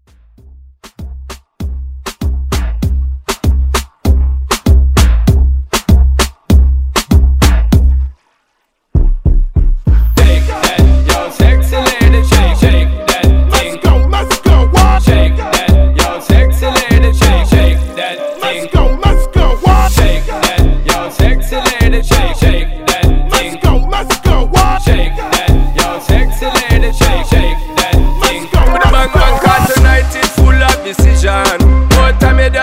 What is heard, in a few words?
Moombah Hype Redrum